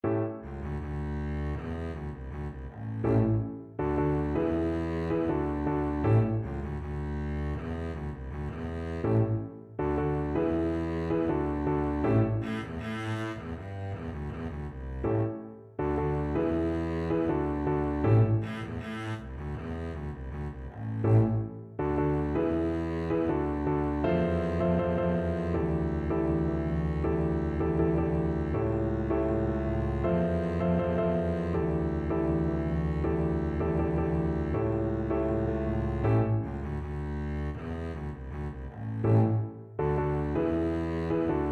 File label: happiness is the truth, double bass